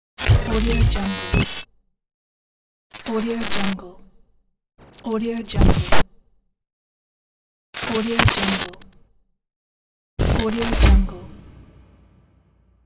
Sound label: distortion glitch pack